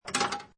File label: Pinball Sound Rail Or Metal Bar Movements; Video Games